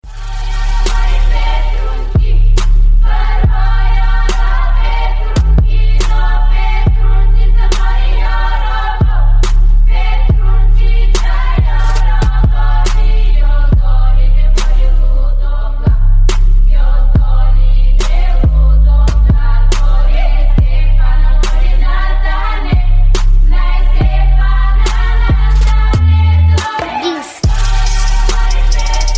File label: bulgarian trap